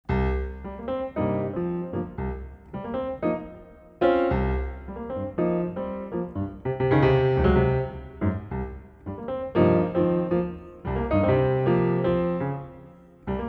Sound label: Piano Jazz